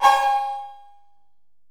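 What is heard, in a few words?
808 cowbell; Wizoo - Platinum 24 Electronic; 480L SP 808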